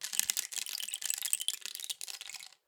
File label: Rain Stick, Percussion Samples